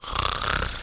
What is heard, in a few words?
pig snore